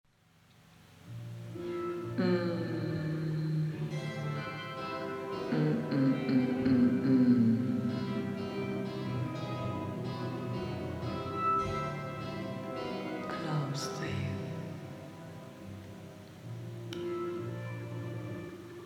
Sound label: romantic